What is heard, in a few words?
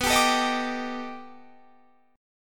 B 7th Sharp 5th Sharp 9th, guitar; strummed